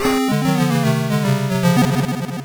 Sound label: Death; deep duck trouble master system